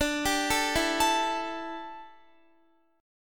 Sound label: D Suspended 2nd Suspended 4th D down Guitar